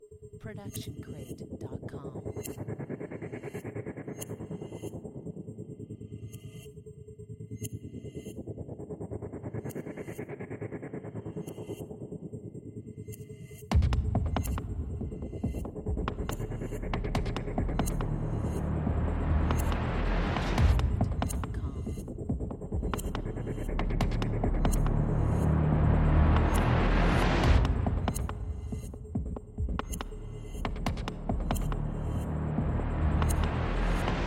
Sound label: stealth mode; soundscrate